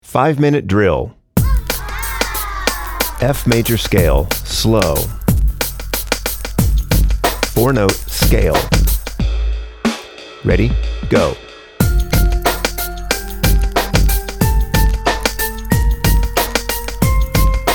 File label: key f major slow